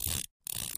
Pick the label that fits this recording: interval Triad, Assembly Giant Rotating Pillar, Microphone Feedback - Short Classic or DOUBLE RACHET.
DOUBLE RACHET